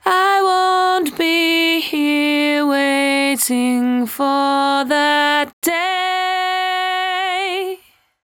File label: 74-5bpm B, Velvet Vocal; While U Could; Zero-G